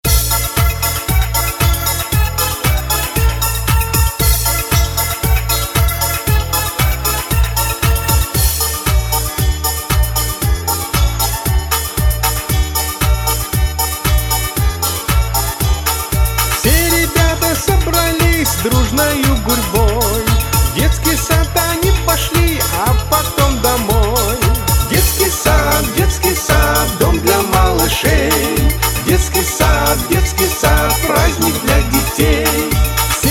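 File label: Children's songs, Kindergarten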